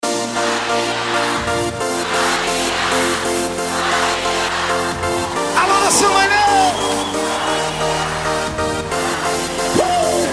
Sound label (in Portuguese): funk remix